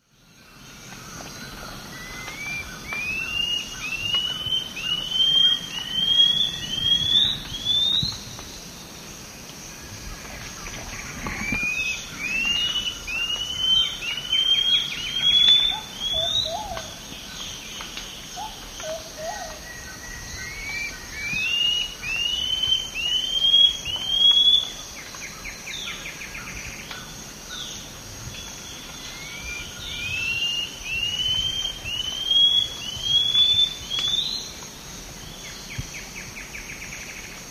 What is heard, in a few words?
Hylophylax poecilinotus, song; S. America; Eastern Lowlands